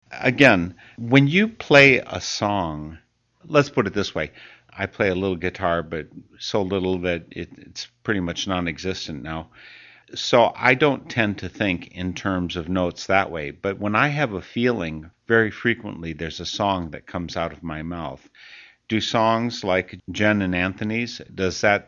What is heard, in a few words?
instrumental